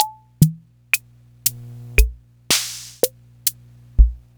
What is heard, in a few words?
univox, Samples Sliced; m8